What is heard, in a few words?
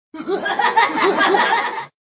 laugh; sweet tooth